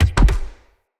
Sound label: Bow Shot Punch; Weapons; Player; DendritBow